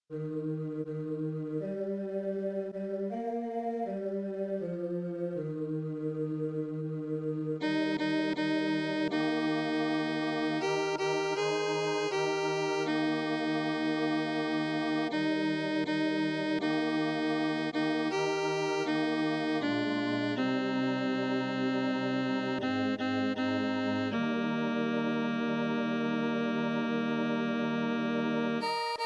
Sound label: Lord I want alto; Si ca vous chante